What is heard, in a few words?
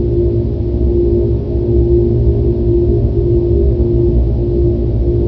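ambience machine; dm criticality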